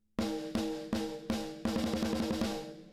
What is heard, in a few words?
Crushed Snare; Pulsar 1178